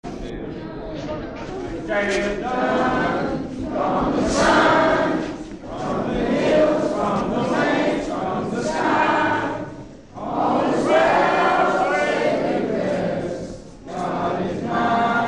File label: taps